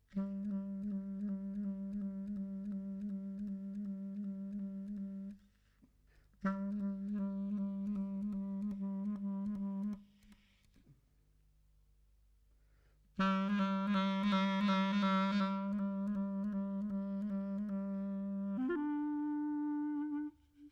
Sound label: e-flat-clarinet